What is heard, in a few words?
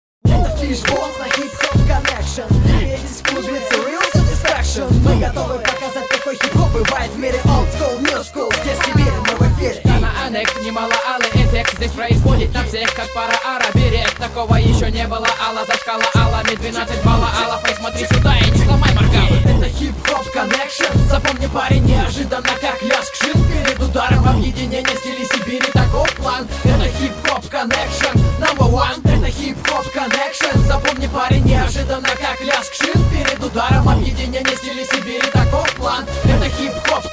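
jingle hip-hop; hip-hop connection party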